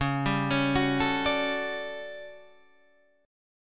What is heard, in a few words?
A 13th C sharp, guitar chord